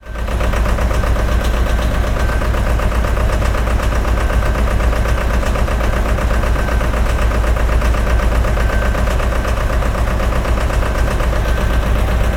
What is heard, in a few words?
Rhythmic Truck